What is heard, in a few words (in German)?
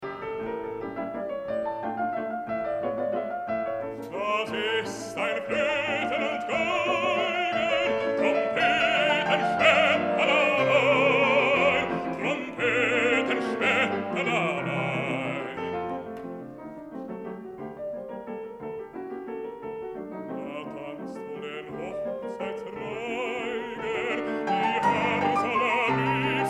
Es ist ein Floeten und Geigen